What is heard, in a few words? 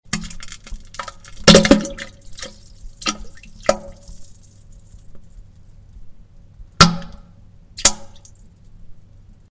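sounds of diarrhea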